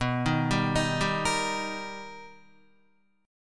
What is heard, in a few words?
B Augmented Major 7th B Guitar, chord; B+M7; arpeggiated; JGuitar